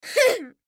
Human Cough; Clear Throat Breathe Male Ten Yrs Boy; Human Clear Throat Breathe Male Ten Year Old Boy; Clearing Throat; Blastwave

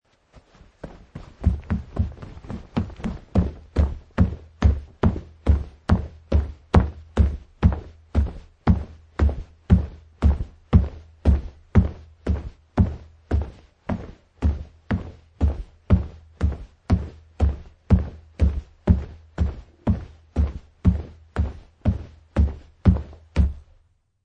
Jogging; Footstep - Man wearing jeans and hiking shoes jogging on carpet surface

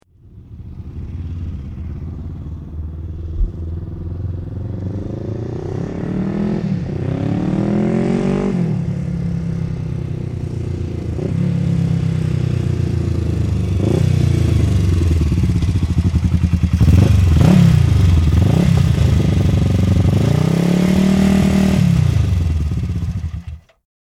Motorbike Ducati 350 Drive Up and Stop; Tom Hutchings

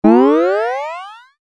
Generic Bonus Or Pickup, video game; Video Games; Stuart Duffield

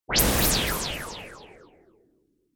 Laser Swish Delay, whoosh sound effect

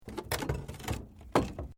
Metal Light Movement Minor; Materials

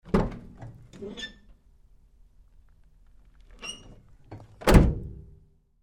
Truck GMC Savana Door Side Open Close Lite; 2010 GMC Savana; Trucks; Big Room Sound

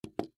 Kitchen Timer Set, Timer Set Down Wood; Household; Blastwave

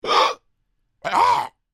Creature Troll Gasps, Monster; Monsters (Sound Effects:Horror); Big Room Sound

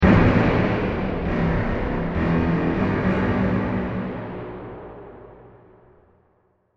Crash Impact With Resonant Echos; Blasts; Impacts; Sound Effects:Impacts; CraftedSignals

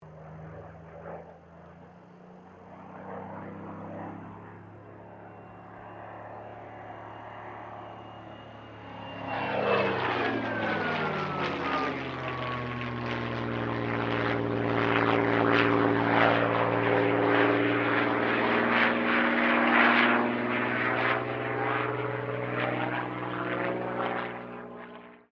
AIRCRAFT SUPERMARINE SPITFIRE MK-XVI LOOP; Airplanes (Sound Effects:Vehicles)

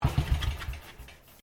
MOTORBIKE BSA GOLDSTAR TURN OFF; Motorcycles; Vehicles

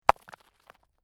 Rock Fall And Tumble Onto Rocks; A small stone or rock falls on other rocks. Rock impact and settle.; Lynne Music

